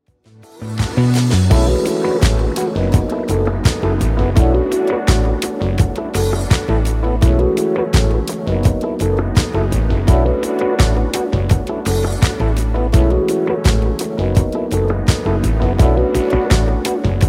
Bubbles

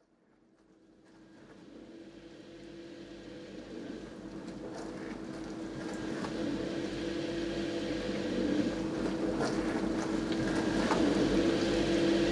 dishwasher s chant

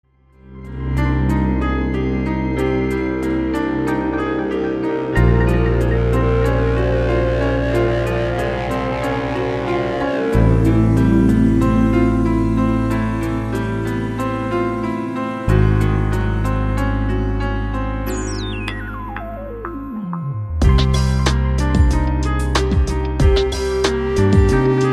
Piano

